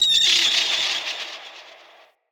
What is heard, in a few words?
Corrupted Beach Seagull Day; Ambience; Midrath Island